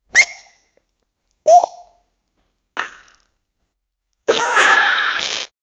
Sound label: womans explosive diarrhea